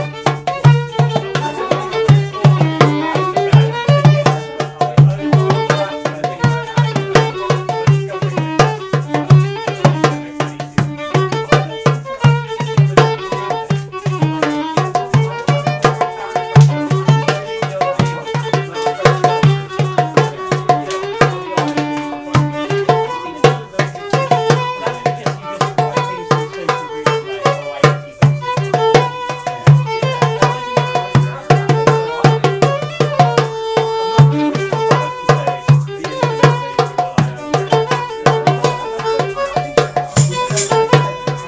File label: klezmer at the black smock inn